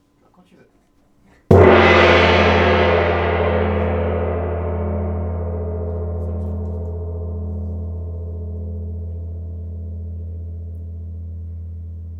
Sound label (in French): Petit centre, GONG VIBRATION